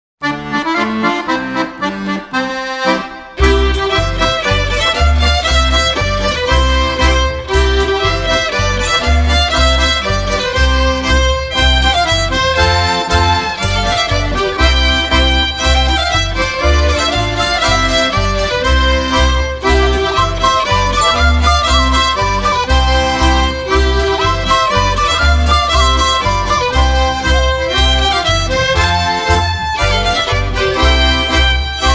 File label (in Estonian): polka; Tursamae; voru talvine tantsupaev